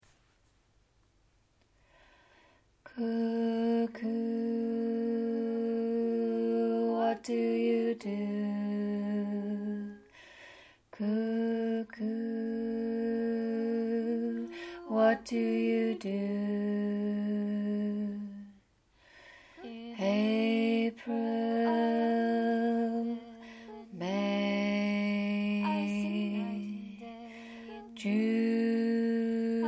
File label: cuckoo low; Bass